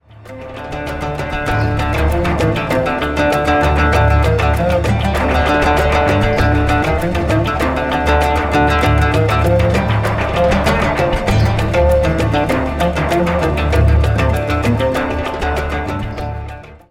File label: Rhythm for Oud